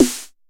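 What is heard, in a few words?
TR909 Snare, Roland SH-32 Drumset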